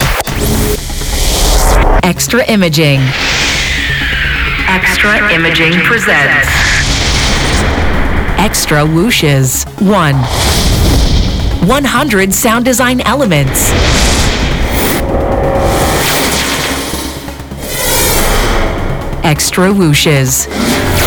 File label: whooshes